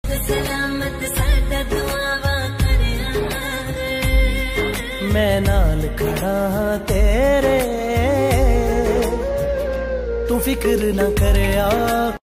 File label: ringtone